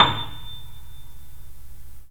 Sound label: 3D Pianos Yamaha C7; E-MU Producer Series Vol. 5